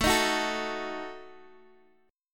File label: Eb Diminished A